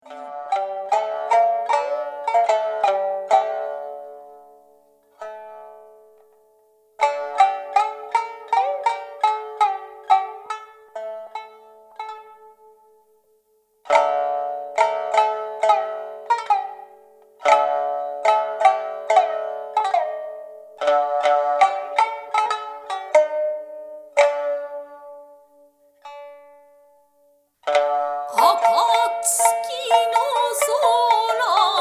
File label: shomyo